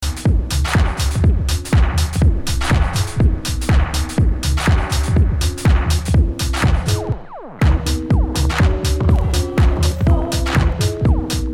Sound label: Broken Keyboard